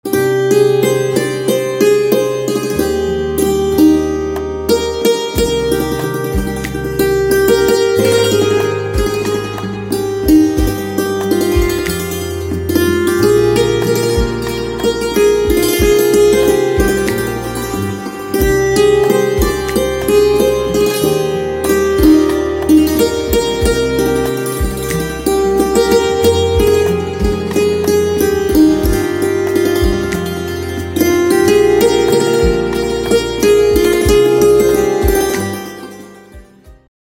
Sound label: Santoor, instrumental ringtones